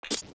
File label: Steps Ice Jump; zelda; OOT